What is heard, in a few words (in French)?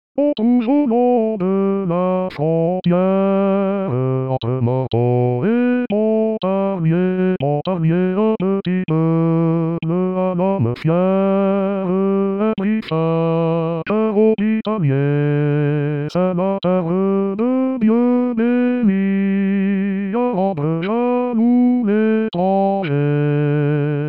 basse